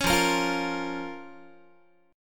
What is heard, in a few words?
F Suspended 4th Sharp 5th F, Guitar; Fsus4#5; strummed; 16,13,13,15,14,x